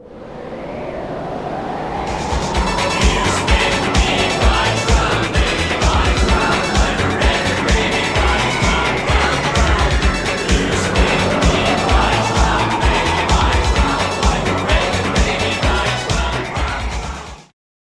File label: Tornado; Touristtrap; Burnout CRASH!; Xbox 360